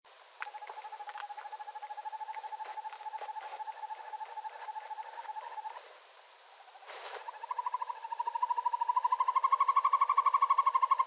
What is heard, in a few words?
sperbereule typical